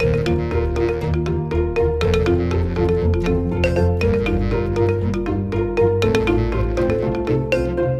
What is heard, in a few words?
classic xylo; rayman origins 2011 gamerip; music world